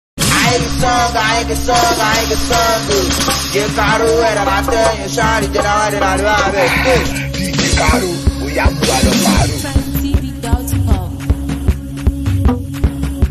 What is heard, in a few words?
Beat